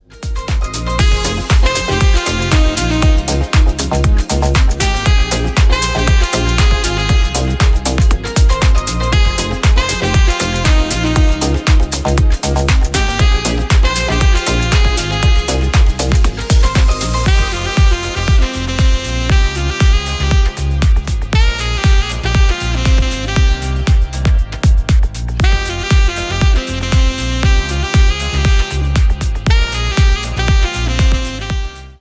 sax cover

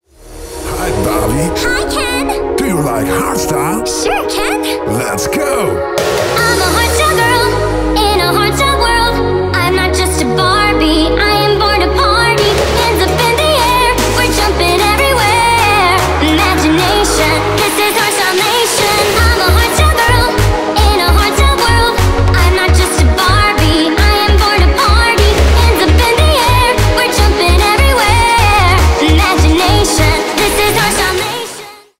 hardstyle

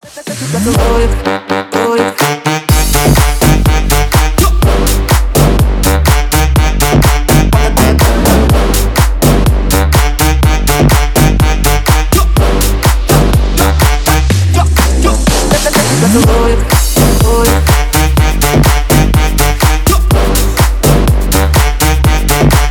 trap remix

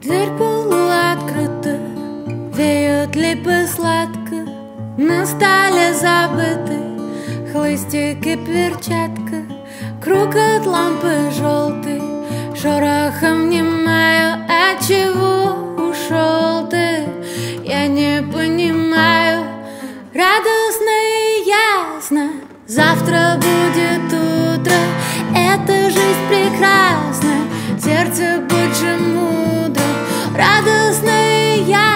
acoustic version

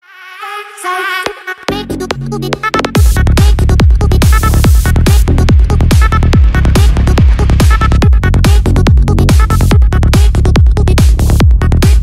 psytrance remix

